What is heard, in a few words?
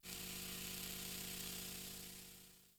Melee Slam Smoke, Impacts, Ground Stab; Warframe